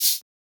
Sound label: Shaker; FLPRO